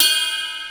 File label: bell hit; E-MU Formula 4000 Series Vol. 2; OrbitPercMaster